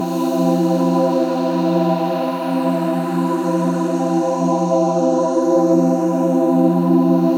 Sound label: 130 D Synth Cream Pad; Zero-G; Future Wave